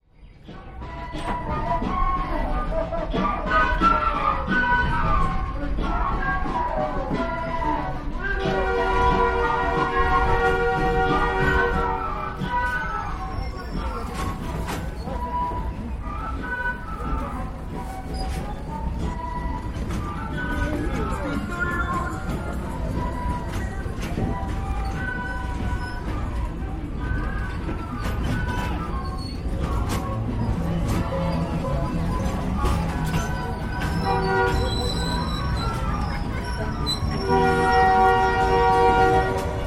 train to cusco flute band horns and market traders